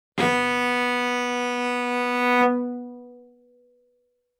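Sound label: Cello arco fortissimo sul A B3; MIS Pitches - 2014; Strings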